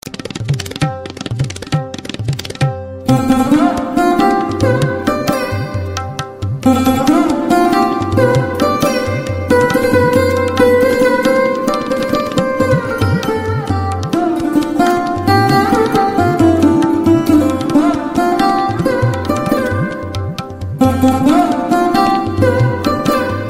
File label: Table N Sitar